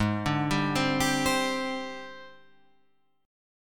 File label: G sharp Major 7th Flat 5th; x,x,6,7,7,7,9,9